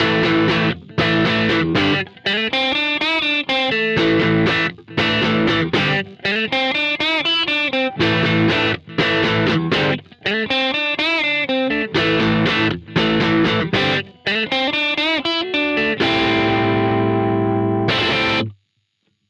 pushed tweed, test

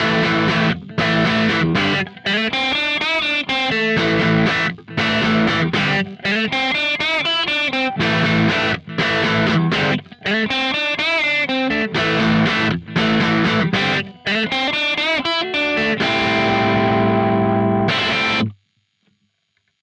1968 Crunch

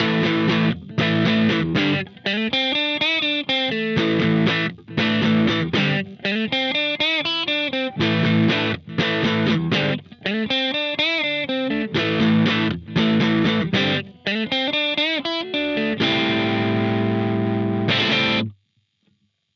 Grid Crunch V2

